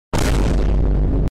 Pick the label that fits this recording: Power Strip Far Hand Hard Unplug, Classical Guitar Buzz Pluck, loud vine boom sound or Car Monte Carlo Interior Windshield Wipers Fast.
loud vine boom sound